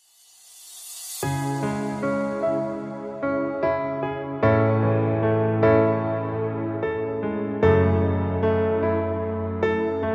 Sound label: acoustic version